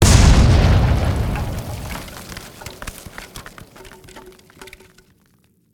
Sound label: Powerful Explosion With Debries